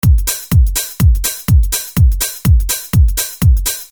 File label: loop; Mirex